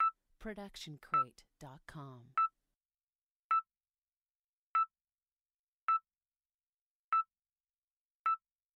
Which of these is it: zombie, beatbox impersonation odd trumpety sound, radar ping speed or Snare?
radar ping speed